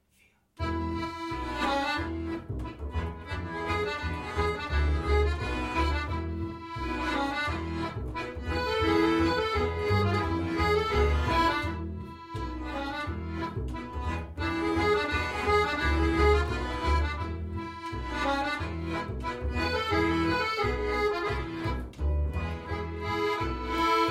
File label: Klezmer